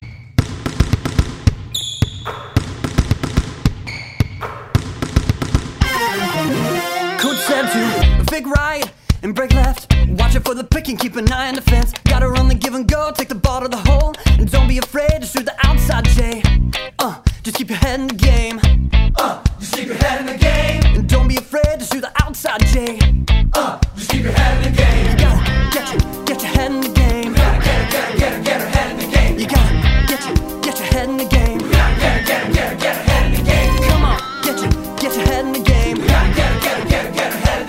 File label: Vocal